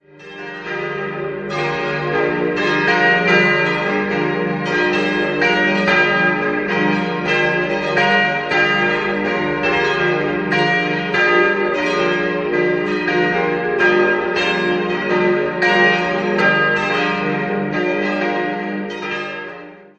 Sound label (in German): Schoenwies, glocken